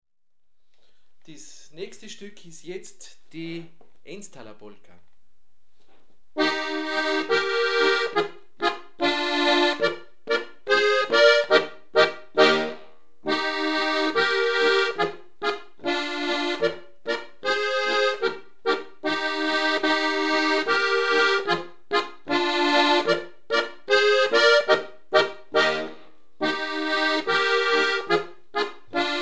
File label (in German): Polka in Es - Dur